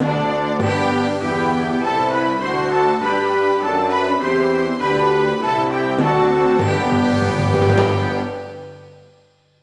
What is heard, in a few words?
Anthem Netherlands; Buzz! The Mega Quiz; PS2